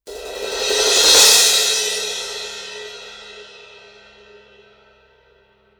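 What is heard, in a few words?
med thin crash 18 performance, cymbal; sabian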